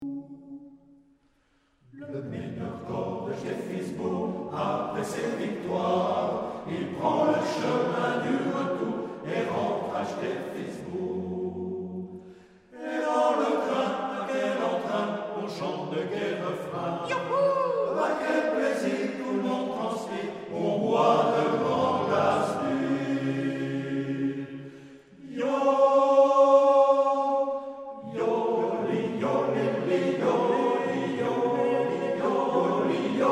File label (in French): musique